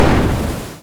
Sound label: ship miss small effect; Hearts of Iron IV Death or Dishonor